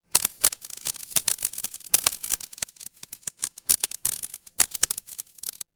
fire electric crackle

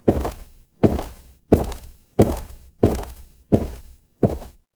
footsteps gravel

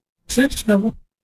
office ringtone

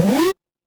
retro game jump

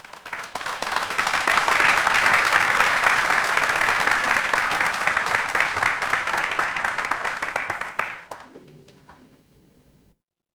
crowd applause

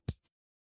ui app open

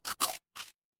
cartoon chomp

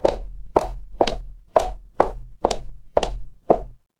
footsteps heels

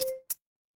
feedback success

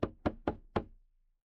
impact wood knock

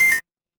retro game coin

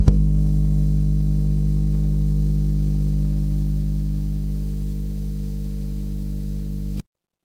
fire electric hum

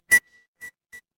retro game coin

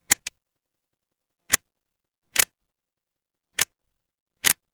office camera

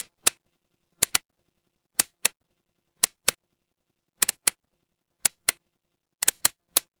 mechanical switch

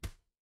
impact slam

